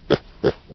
pig; mobs; mobs redo

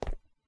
bedrock step; dfcaverns; underworld items

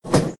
cart rail; boost cart

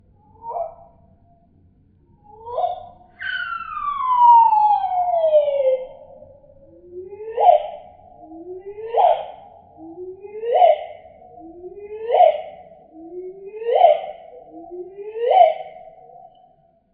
solitary bird song; ambience; dfcaverns

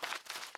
enchanting scroll

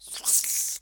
mobs spider attack; server-nalc

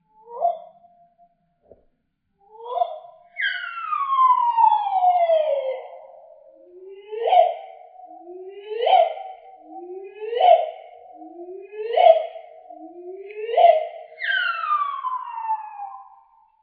solitary bird song; dfcaverns; ambience

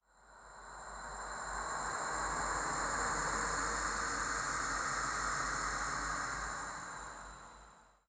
mobs sand monster; server-nalc

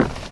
wood footstep; minetestforfun game

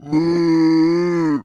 minothaur attack; server-nalc; mobsounds

